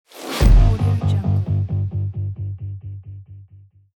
cinematic guitar impact

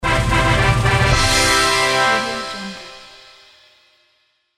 big win sound

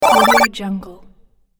bonus score flurry

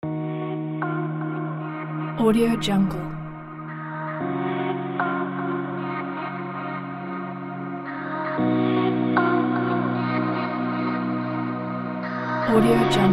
downtempo chill

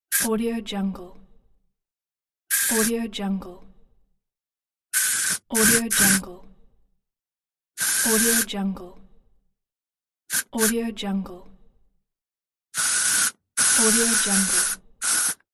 spray sounds; soundall

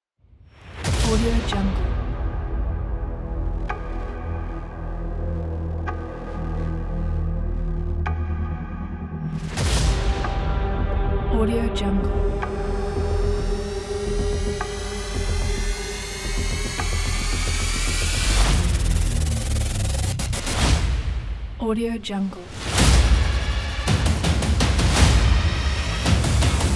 hybrid epic trailer

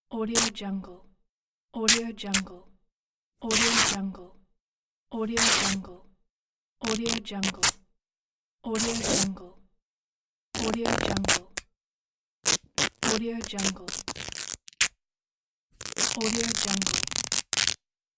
cloth ripping

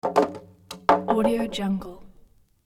plank drop clumsy hit; hollywood edge

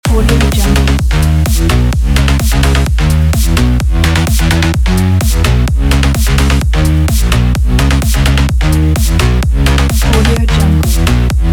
electro loop

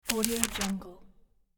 paper sound

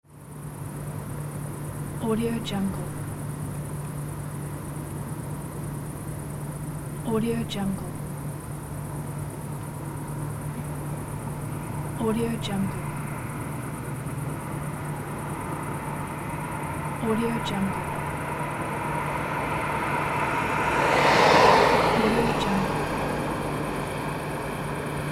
city night

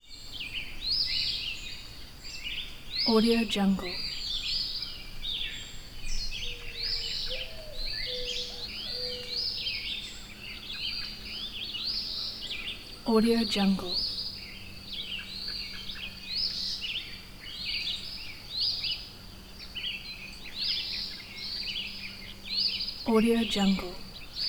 forest birds upstate new york deep woods dawn; prosoundfx

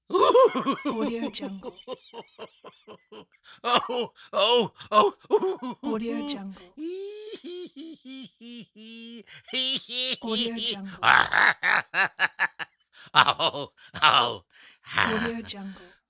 male stupid laugh

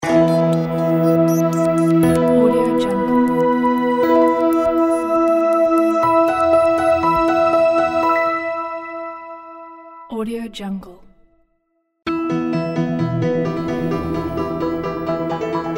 modern logo

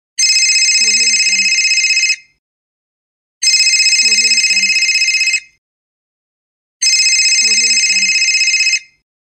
phone; bbrealsound

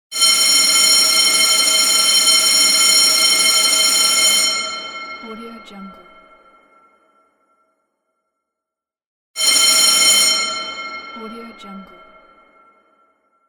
school bell

